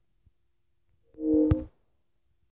Video chat

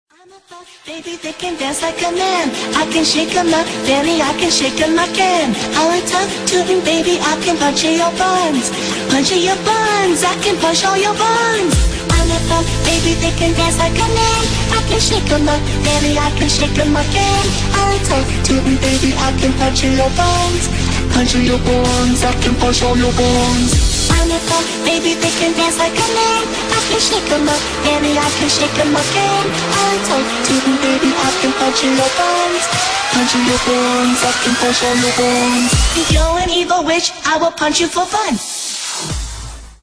dubstep remix

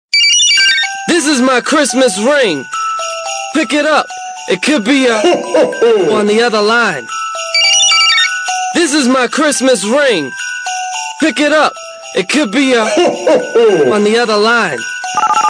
xmas ring

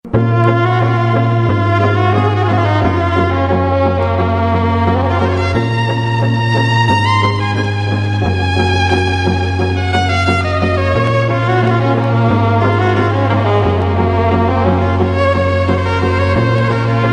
violin; electronic

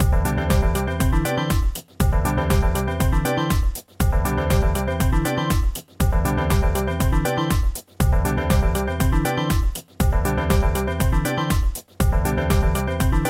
Bell Chime House

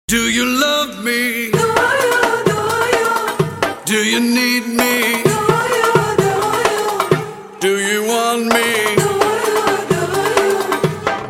arabic, ringtones

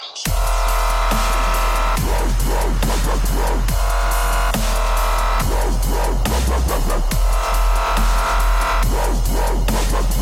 dubstep santa

